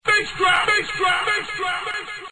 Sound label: basedrop